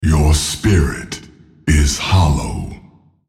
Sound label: attack; Nasus; Character Voices - English